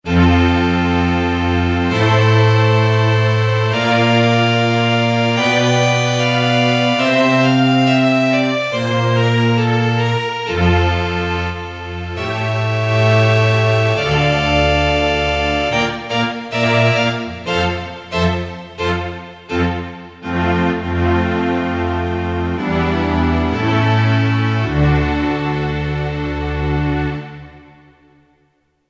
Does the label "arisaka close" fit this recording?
No